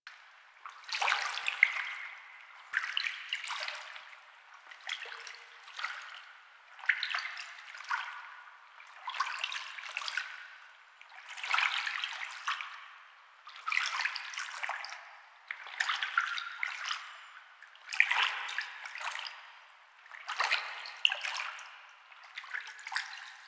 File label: Exterior Lake Water Laps Loop; Warframe; Duviri